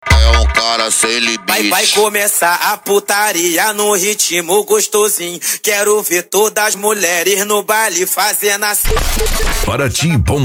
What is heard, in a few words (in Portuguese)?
bombox funk